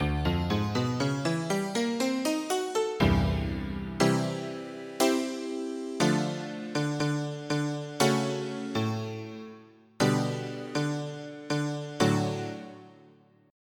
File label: Honky-Tonk; EverQuest